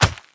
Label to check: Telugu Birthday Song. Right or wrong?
Wrong